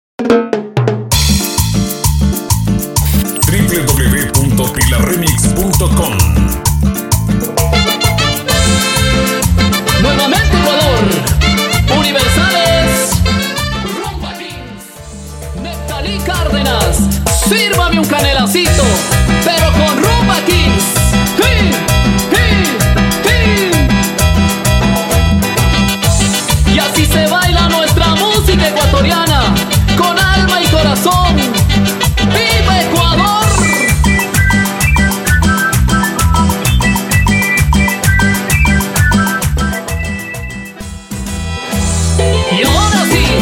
Intro Simple 130 Bpm

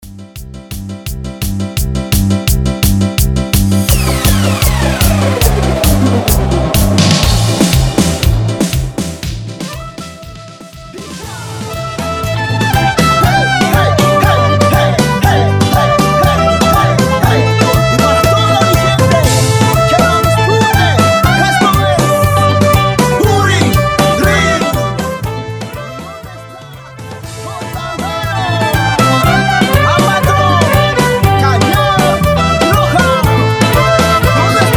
Transition 170 120

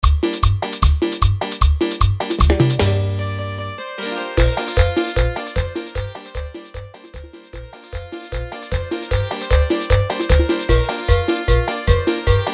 Instrumental Chicha BPM 152

